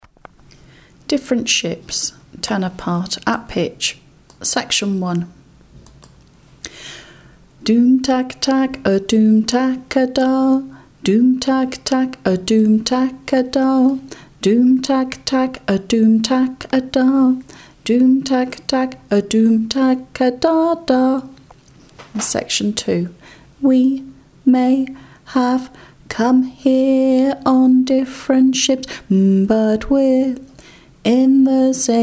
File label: tenor